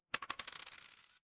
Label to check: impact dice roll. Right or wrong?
Right